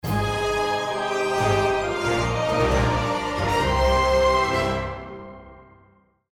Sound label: Win Theme